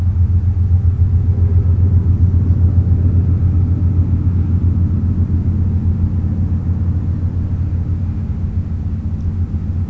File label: ambience